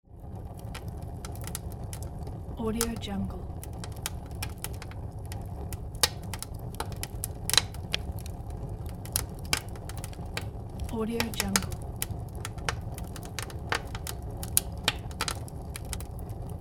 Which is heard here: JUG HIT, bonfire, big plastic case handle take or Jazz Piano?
bonfire